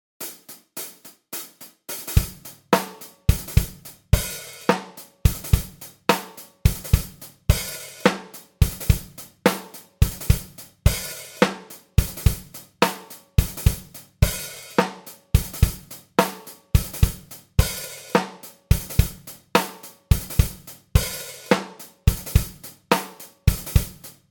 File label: Drums